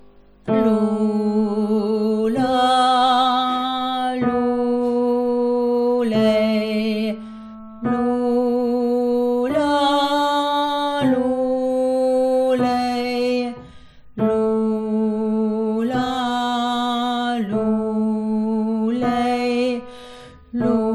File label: lullabye tenor